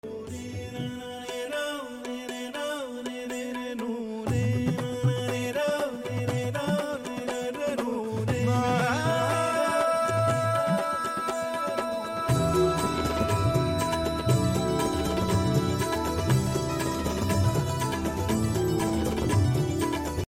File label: songs bgm; matharasa pathinam; aarya; amy jackson